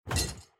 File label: glass hit